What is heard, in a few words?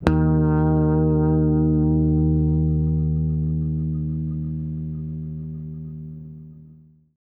OCTIVER BASS; Sampleheads; Ultra Freakin' Bass